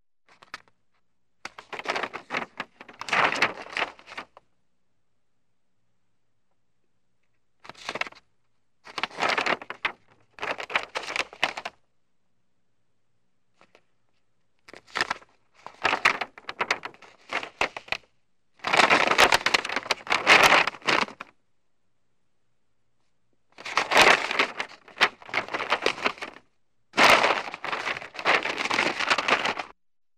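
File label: paper newspaper unfolding, folding, turning pages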